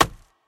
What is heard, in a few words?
build; Rescue Team3